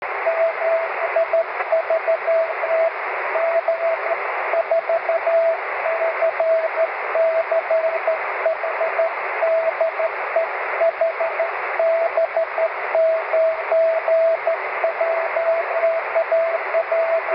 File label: cw, 23cm